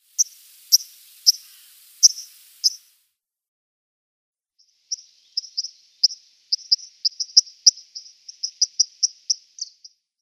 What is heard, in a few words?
White-throated Dipper calls